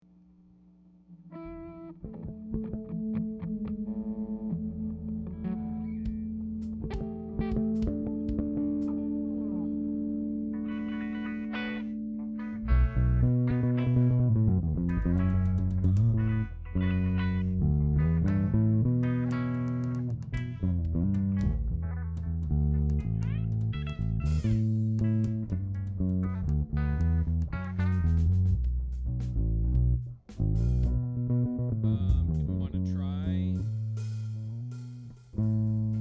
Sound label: Strings Mix